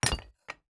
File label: mine stone